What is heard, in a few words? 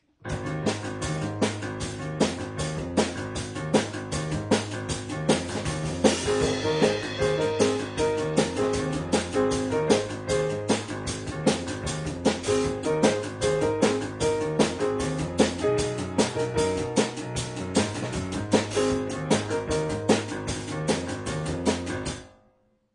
piano drums